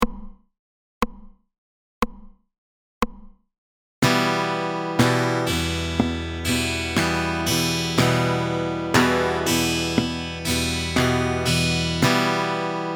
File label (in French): Percussion pouce 60